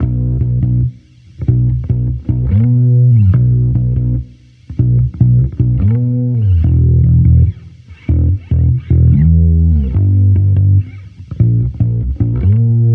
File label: Amped loop 145 Bm, Electric Bass Loops; Slate Digital BASSHOLE Sample Pack